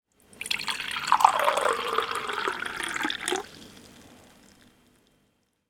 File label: Water In Glass